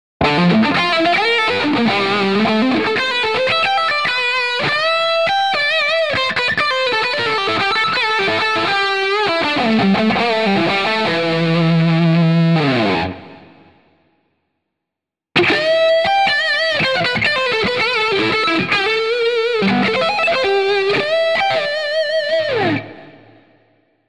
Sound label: ESP SC 20 Bridge PU Neck PU Lead